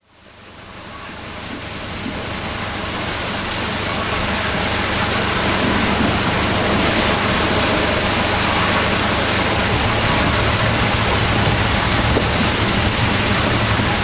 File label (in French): Rouet du moulin; MZ-N710; moulin de Piers